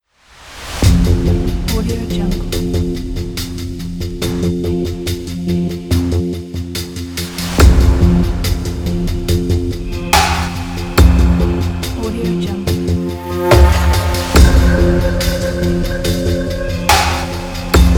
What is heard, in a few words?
suspenseful investigation